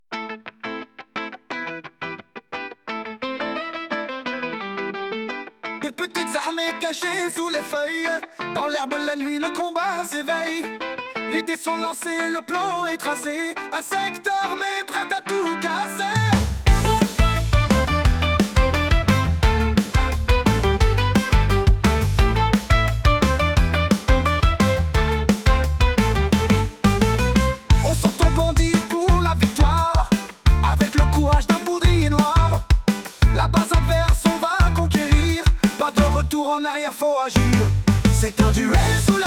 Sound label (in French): musique jeu